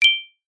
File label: multimedia notification bell chime ping tone muted